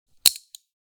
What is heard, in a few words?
Prisoner handcuffs latch opening lever release click; police